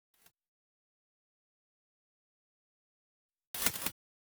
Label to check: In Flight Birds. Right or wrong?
Right